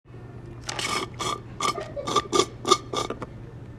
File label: slurp